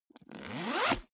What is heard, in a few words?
zipper backpack open accelerate